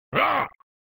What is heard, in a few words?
Male Attack; MK2 ARC Unused